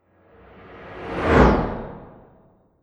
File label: UFO pass; polygoniix Intro Essentials pt2; Whoosh